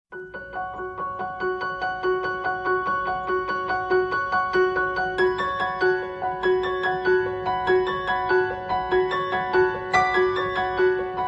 Rabbit Dance (Without Narration)

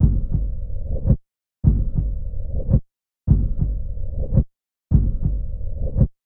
Sting Heartbeat; Brand X Music; Brand X Music Catalogue - Editor's Essentials

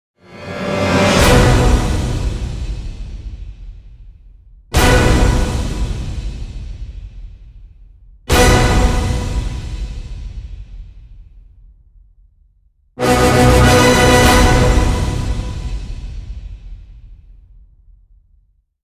Orchestral End Hits (B); Epic Tools vol. 1

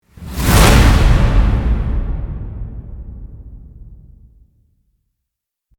Hit Swell; Ninja Tracks

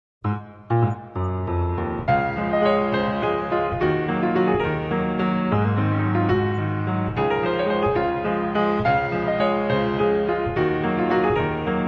piano solo

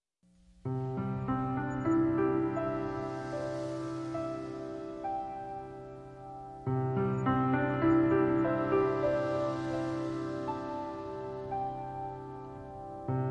Relaxing Piano Lullabies

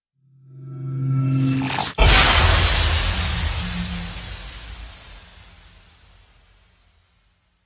Impacts Abstract Explosion; Gothic Storm Music; Advanced Sound Design 2012

